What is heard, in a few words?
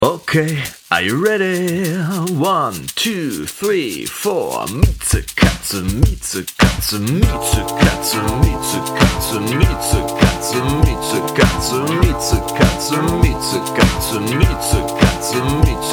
Beat